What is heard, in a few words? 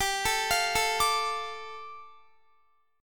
G 7th Suspended 2nd, Guitar; G7sus2 arpeggiated